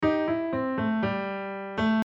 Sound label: double maj pen